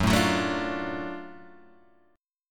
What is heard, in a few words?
Gb Minor Major 7th Sharp 5th Gb down Guitar Open G